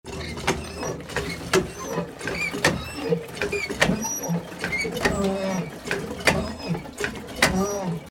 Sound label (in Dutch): waterpomp ringtone